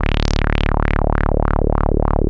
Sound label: WAH WAH D1; CMP - Analogon